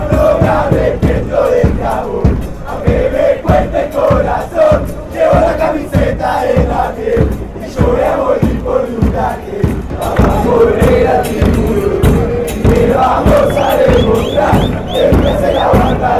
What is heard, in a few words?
douglas haig chant, fanchants argentina